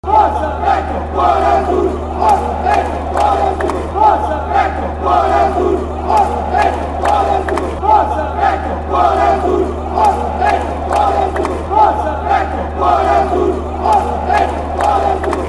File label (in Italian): Forza Vecchio Cuore Azzurro, cori curva nord